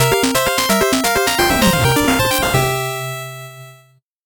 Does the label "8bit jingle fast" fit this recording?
Yes